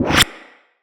Magnetize Deflect; Warframes

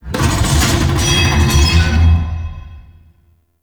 Metal Scrapes And Hits, Asteroid; Warframe; Grineer

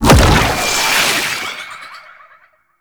Gouache Teleport In C; Warframe; Follie

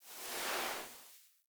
Foley Idle Sit; Warframe; Kubrow

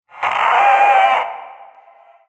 Possessed Red Veil Vocal Death; Warframe; PriestQuest

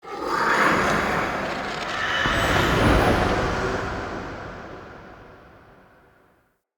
UI Boss Stinger Hard Mode; Hud UI

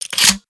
Grenade Launcher Reload; Grineer; Weapons

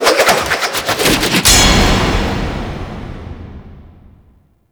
Death, Axe And Shield; Warframe; Tyl Regor

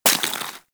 Bullet Impact Debris Snow; Warframe; Weapons; Bullet Impacts

